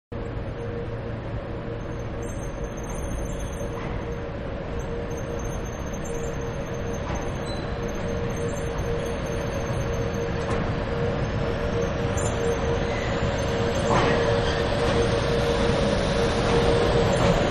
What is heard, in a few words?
Diesel Train Departing End Of Track; Train-Railroad; Trains; Vehicles; Train-Railroad-Departing-End Of Track; Manhattan Production Music